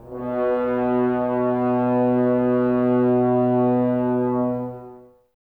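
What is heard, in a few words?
Brass French Horns, Woodwind Brass Ensemble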